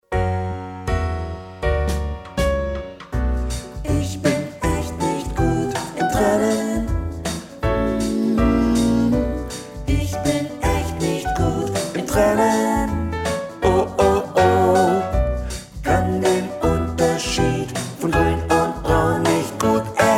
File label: Bass